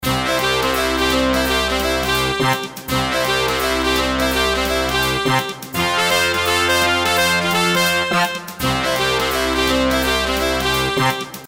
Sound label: Big Band